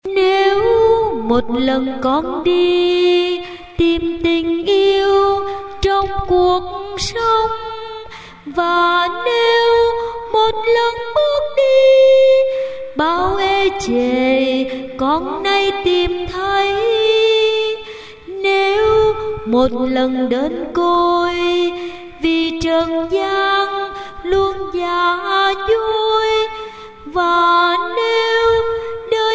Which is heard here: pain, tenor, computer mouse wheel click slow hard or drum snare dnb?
tenor